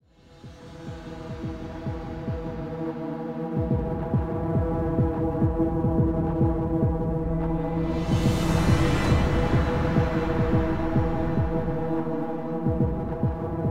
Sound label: Ambiant